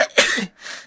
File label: Sneeze